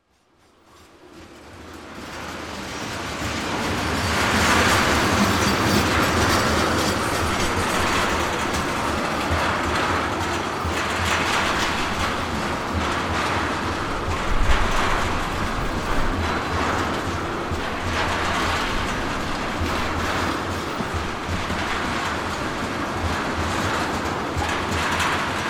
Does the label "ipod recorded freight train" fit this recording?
Yes